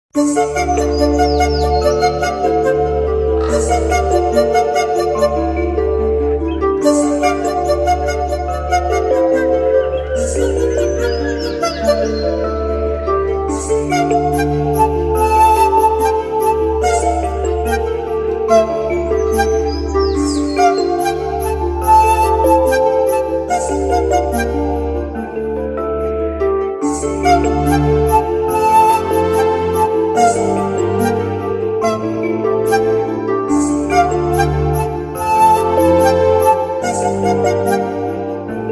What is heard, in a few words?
Heartbeat Theme